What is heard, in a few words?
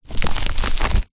industrial sandpaper sheet movement